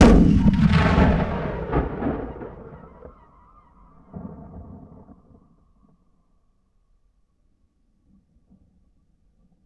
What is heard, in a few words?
Cannon Blast With Metal Sway, Period Combat; Sony Pictures Sound Effects Series